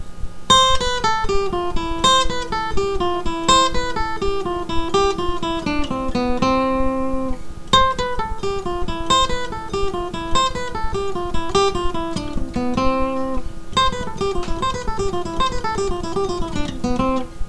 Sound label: c major exercise